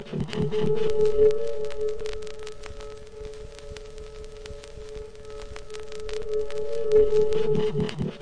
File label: suspense ambience; LOOPING AMBIENCE